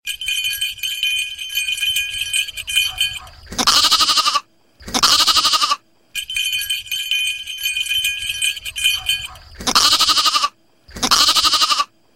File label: Lamb Voice